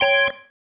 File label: Stab, Organ, One Shots